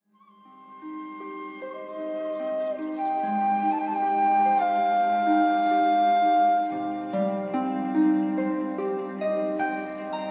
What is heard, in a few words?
Healing Flute